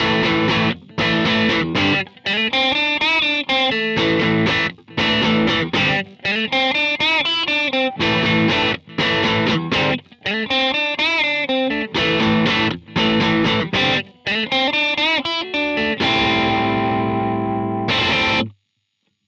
Vintage Crunch

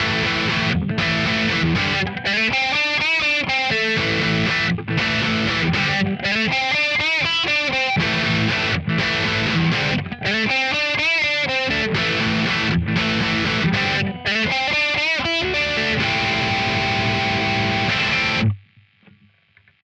Swich Axe Lead

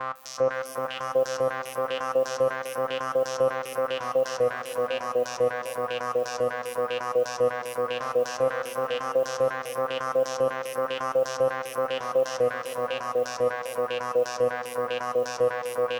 ARP Progressive Chords

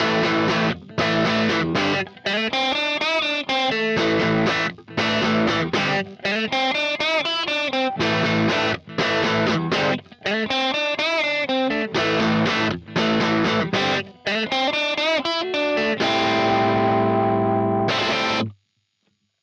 Jubilee

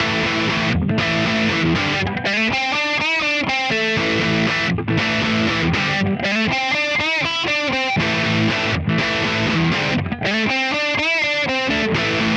high gain EL34, test

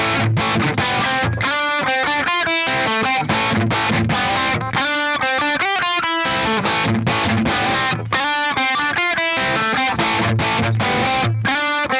HIGAIN FX; interface test; KOMPLETE AUDIO 1

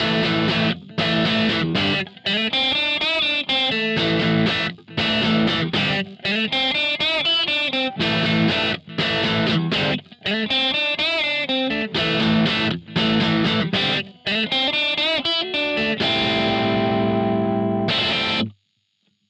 Overdriven Deluxe

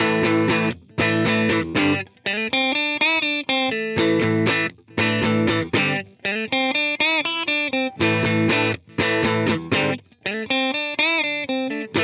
ACBoostV2

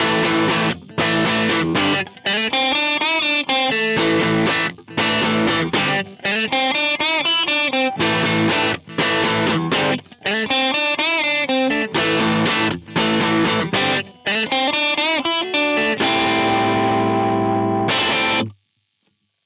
AC Crunch

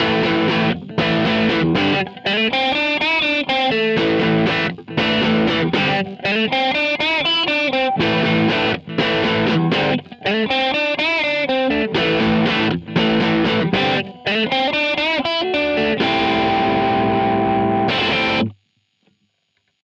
American Crunch

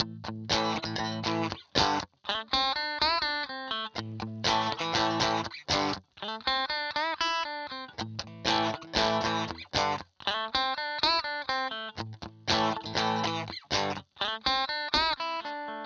YJMSig Rear

